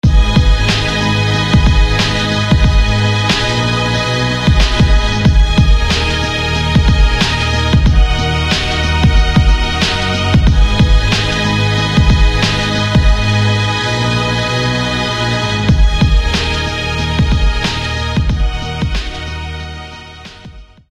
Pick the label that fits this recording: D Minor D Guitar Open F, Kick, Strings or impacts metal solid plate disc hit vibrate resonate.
Strings